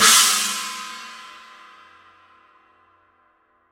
PST 8 Reflector China Paiste

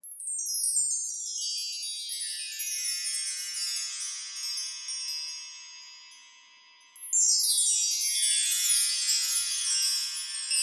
Meinl HCH2BK Chimes

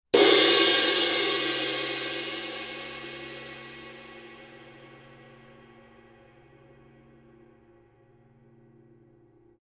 Color Sound 900 Blue Ride Paiste

